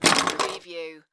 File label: Pinball sound, ball rattling objects